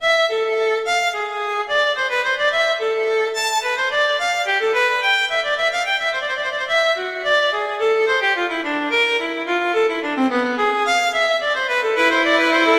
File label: Gigue; Bach; Suite 2